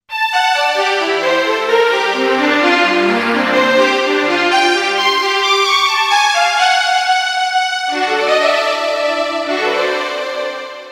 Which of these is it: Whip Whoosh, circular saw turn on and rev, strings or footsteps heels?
strings